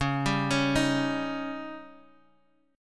C sharp Suspended 2nd Flat 5th C sharp Guitar Half Step Down, chord